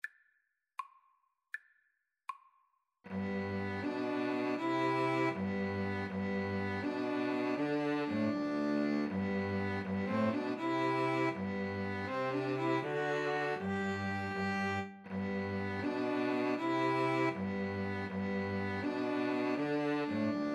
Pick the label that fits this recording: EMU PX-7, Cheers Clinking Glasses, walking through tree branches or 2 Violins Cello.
2 Violins Cello